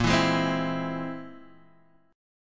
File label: C Augmented 7th A sharp